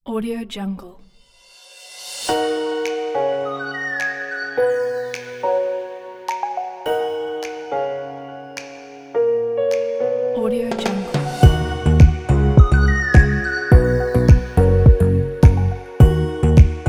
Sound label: upbeat summer pop